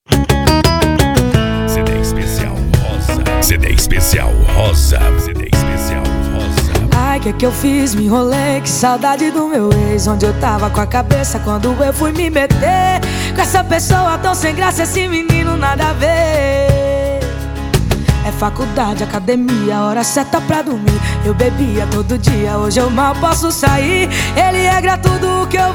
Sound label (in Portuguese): sertanejo sofrencia e romanticas